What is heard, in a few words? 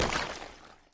ice block smash; frozen rush